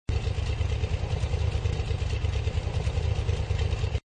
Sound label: Wheelroll; Shengxiao